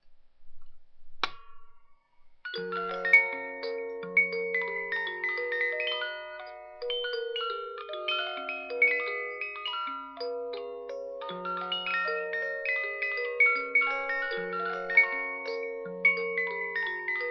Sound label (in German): thorens kleine spieldose